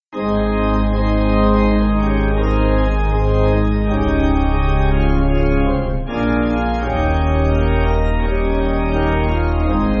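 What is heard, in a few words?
Pipe Organ, church backing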